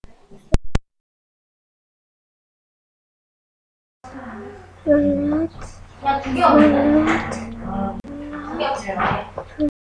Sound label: flute